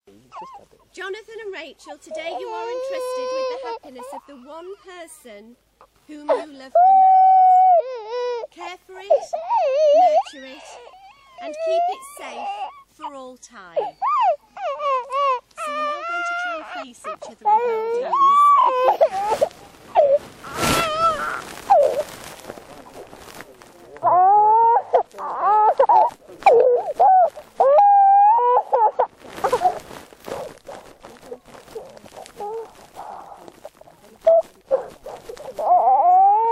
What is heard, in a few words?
someone crying